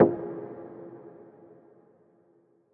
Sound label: levelup